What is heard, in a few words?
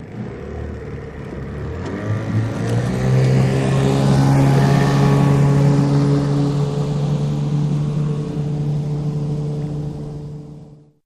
50 hp Evinrude Boat Away Drive Away From Dock